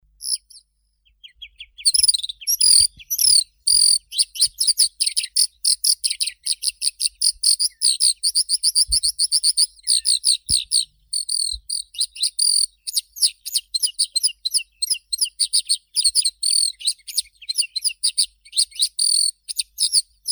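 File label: oriental skylark, Alauda gulgula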